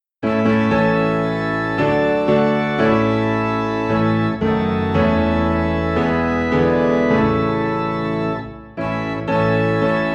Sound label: Piano and Organ Low in A; What a Wonderful Savior